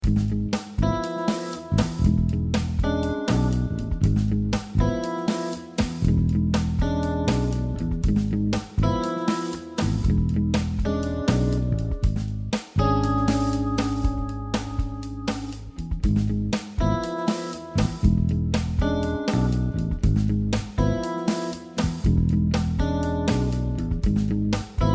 Ab Locrian bb7 Jam Track; harmonic major modes; jam tracks volume four

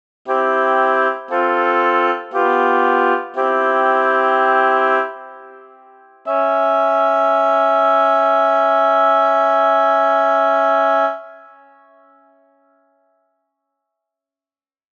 C#3 E5 Oboe 2 Note Classic; Level One “Classic” Example; ear training two note

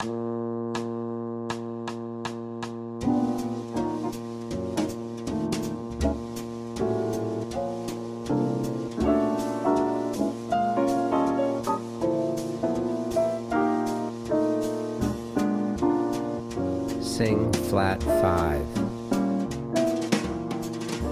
Bb Rhythm Piano Drone Sing Alto; rhythm changes ear training; Applied Jazz Ear Training; Practice Perfect

